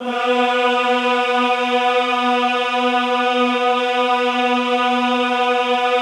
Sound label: EHH B2, Classical Choir; Best Service; Extended Classical Choir; EHH MIX